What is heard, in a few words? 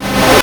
Arcade Riffs